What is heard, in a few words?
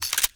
Refill Clip; Warframe; Duviri; Boon Powers